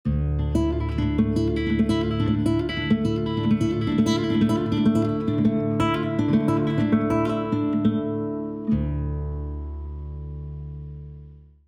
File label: Motif Gallop Sketch